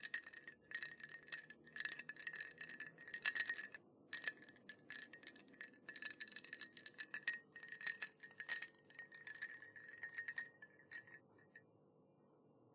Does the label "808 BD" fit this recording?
No